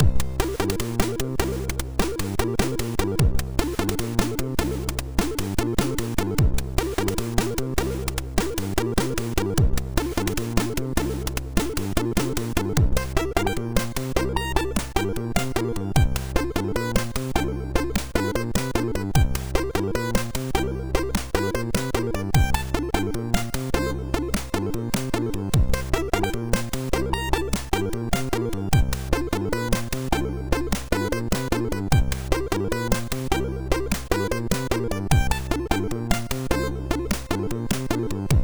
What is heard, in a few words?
Jazz, sid MOS6581R2; Spike